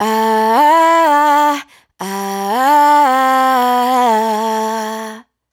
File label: riff Am, Dry Vocals; Raw Vocals; Slate Digital Omnivox Vocal Sample Pack